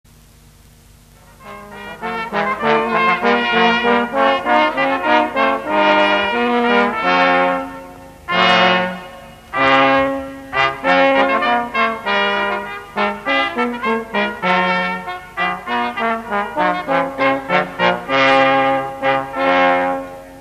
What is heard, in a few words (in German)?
Blasinstrumente